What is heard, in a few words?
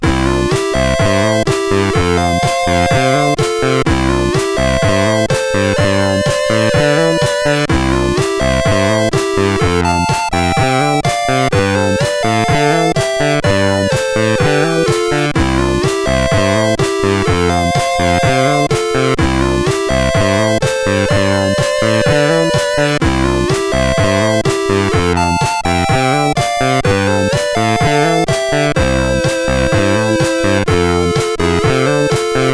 Action Biker Tune Study, sid CSG8580R5